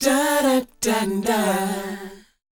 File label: DOWOP D, Vocal R&B DOO WOP; ILIO; Vocal Planet